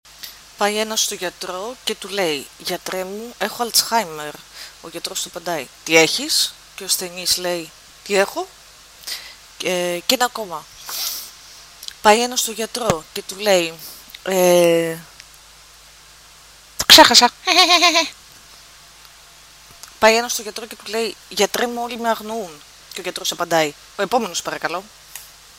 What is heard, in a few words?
hehehehehheheh